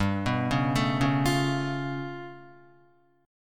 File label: G 9th Flat 5th G down Guitar; arpeggiated; G9b5